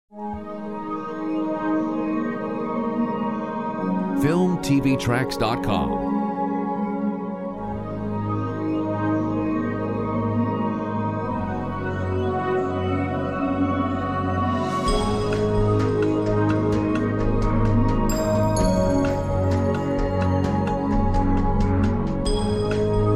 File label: Bio tech